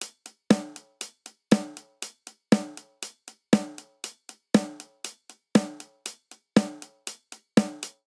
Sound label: Classic Rock Snare & Hihat 119 bpm; 4 4; MIDI Clips; Ableton; Factory Packs; Drum Booth